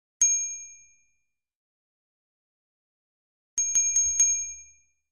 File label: Bells Small Bell Rin